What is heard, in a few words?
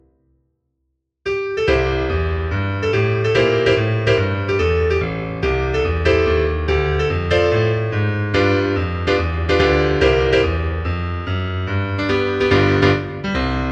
Jazz